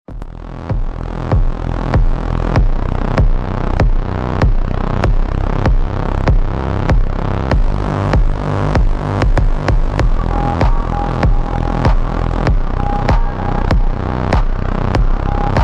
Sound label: hard slowed reverb bass boosted